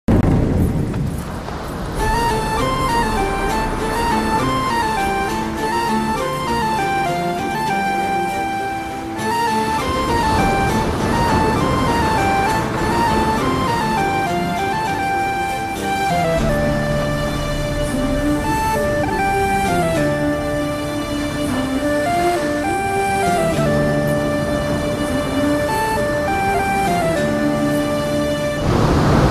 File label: sad bgm romantic bgm